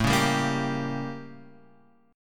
A 7th Flat 9th; A7b9 Chord {5 4 5 3 X 3} strummed

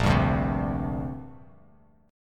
A sharp 6th Add 9th, Bass Standard; strummed; JGuitar

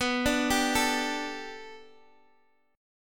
B Minor 7th Sharp 5th B Guitar Open D, chord

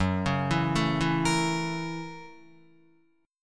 Gb 7th Sharp 9th Gb Guitar; arpeggiated; Gb7#9; JGuitar

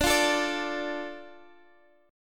D Minor D Guitar Standard; x,17,15,14,15,x; Dm strummed

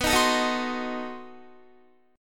B 7th Flat 9th B Guitar; B7b9; strummed

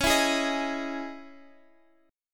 C sharp Minor Add 9th C sharp, chord; C#madd9; strummed; JGuitar

